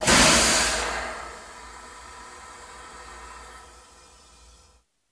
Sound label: Airlock Door Close, pneumatic